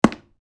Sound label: Dartboard impact dart hit; Darts